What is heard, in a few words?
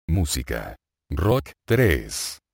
rock